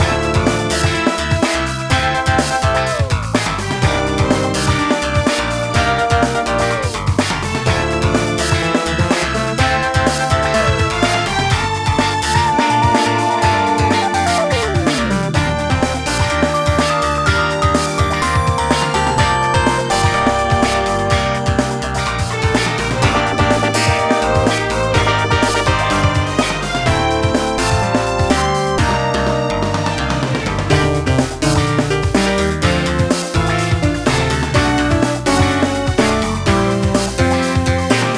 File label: Boat chase LOOP; Deathwish Enforcers